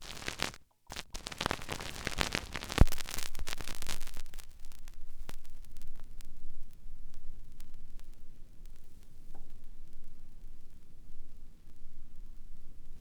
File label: vinyl; Masterbits; Vinyl Attack